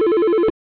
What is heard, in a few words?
Ring